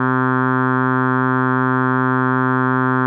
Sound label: bass C4; CCTV